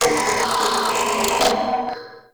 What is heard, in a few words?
Energy Scream; Ambience; Warframe; Corpus Railjack